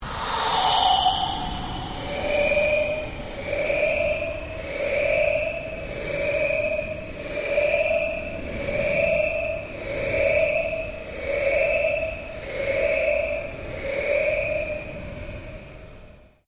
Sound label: Ambient Drone Slow Sweep; Sound effects created by manipulating other sounds; Sound Design; SoundEffects-Foundation1